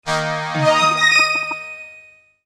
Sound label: Mail sent, phonetones MsgTone; LG; U8500